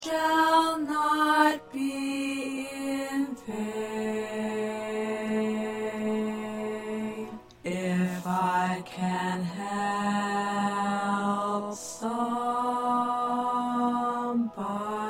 2nd Tenor

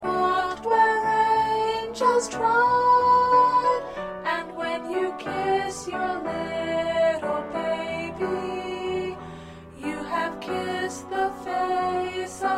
Soprano

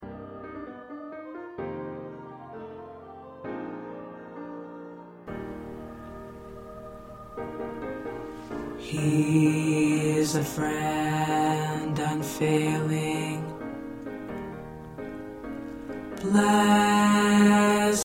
Bass

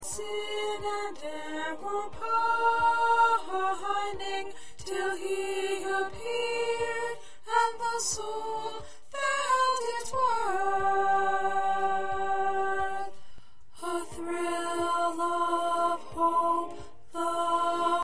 Alto, choir parts; O Holy Night

